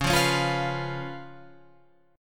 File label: Db 7th Db down Guitar Open F; Db7 strummed